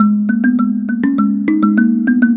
Mbira Low; Melodic; Ambient-Melodics-II

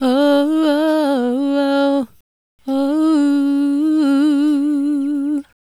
Female Solo, Hip-Hop and RnB Vocals

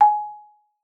MARM G#5, MARIMBA; Xsample VOL-4

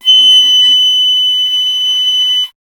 FLT PIC, Piccolo; Miroslav Vitous - Solo Instruments VOL-2

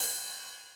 Ride Accent Mid Decay, 909

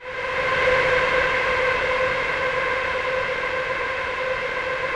DEEP PAD C5, Yamaha VP-1; Music Store; 90's Sample CDs; DEEP-PAD 2

